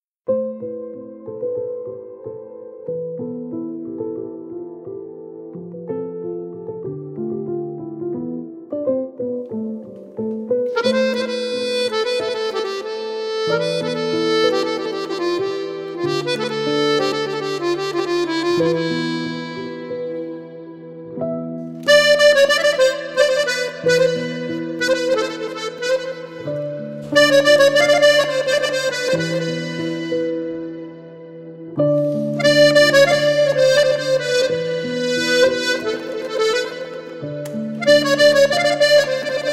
piano akkordeon

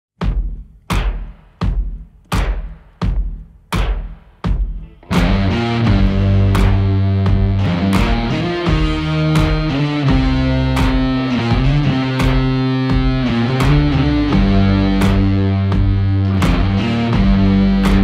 guitar cover

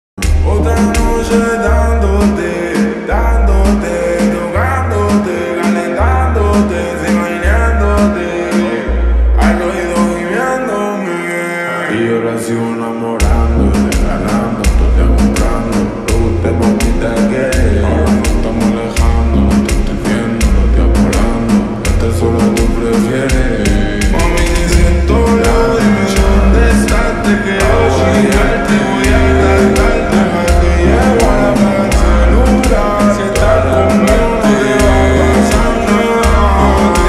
slowed reverb

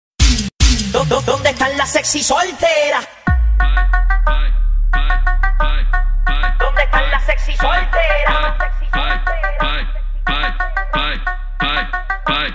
bass boosted

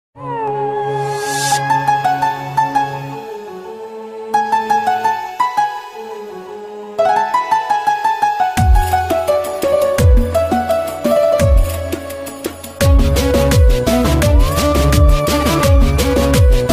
sad persian song

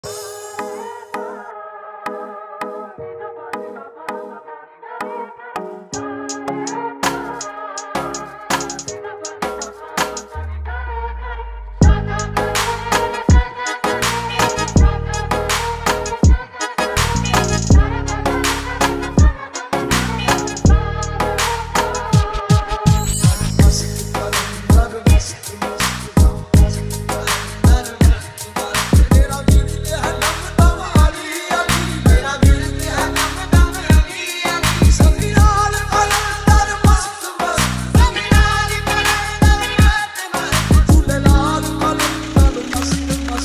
remix bass boosted

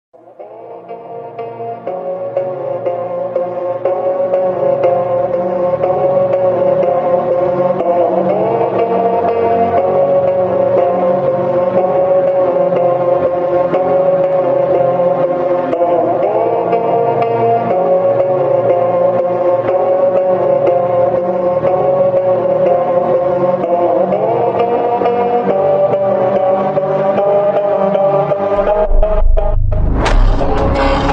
funk

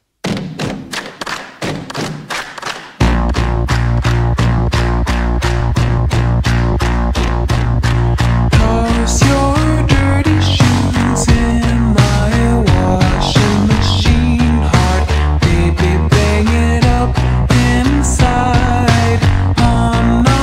slowed

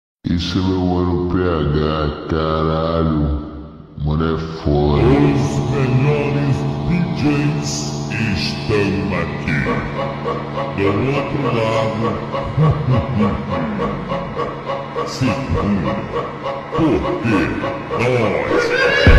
slowed reverb

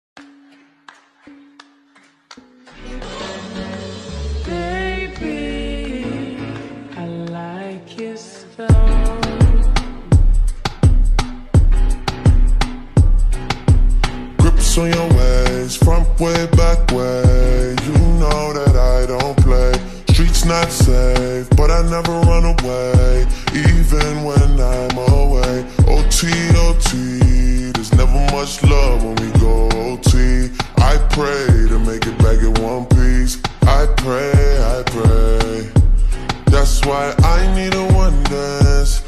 slowed reverb

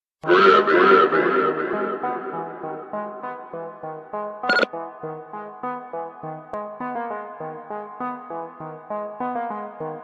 instrumental slowed

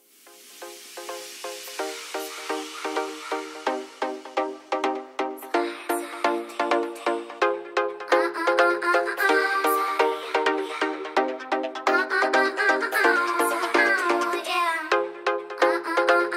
hardstyle remix speed up

